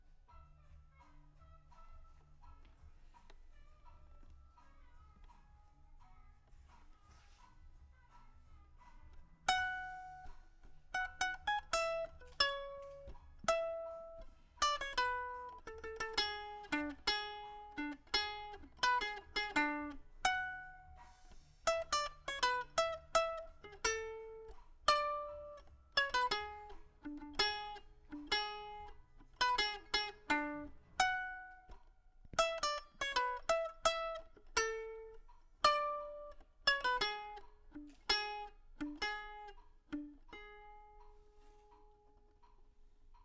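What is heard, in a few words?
guitar Mic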